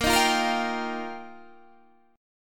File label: F 9th Suspended 4th Bb, chord